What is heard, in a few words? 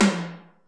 hi tom, Kurzweil K2000